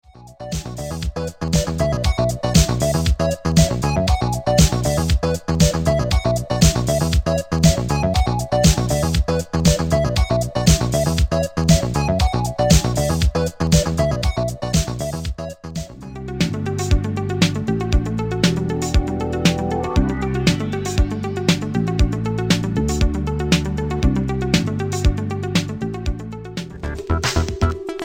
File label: space disco